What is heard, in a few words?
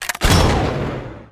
Bazooka Attack